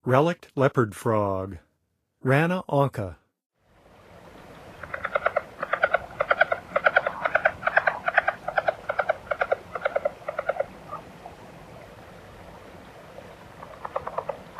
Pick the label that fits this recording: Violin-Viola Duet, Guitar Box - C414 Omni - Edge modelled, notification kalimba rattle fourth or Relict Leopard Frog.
Relict Leopard Frog